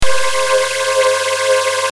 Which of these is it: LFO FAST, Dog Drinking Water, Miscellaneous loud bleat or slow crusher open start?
LFO FAST